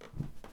Stairs footsteps; Phasmophobia

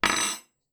metal drop; Phasmophobia

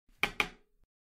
Creak Floorboard; Phasmophobia

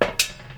Truck sprint; Phasmophobia

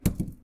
Smudge drop; Phasmophobia

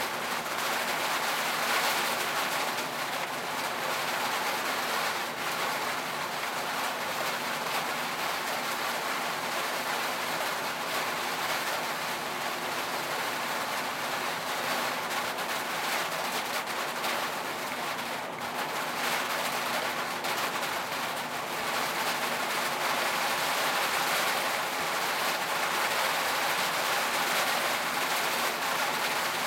Heavy rain metal roof; Phasmophobia

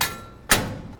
Fuse ON; Phasmophobia; Asylum

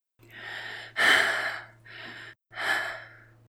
Female Breathing; Phasmophobia

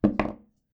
wood; Phasmophobia

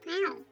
Snowman hit; Phasmophobia

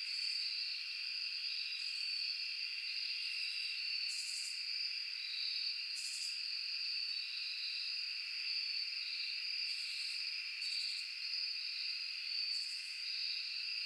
Camp woodwind ambience; Phasmophobia

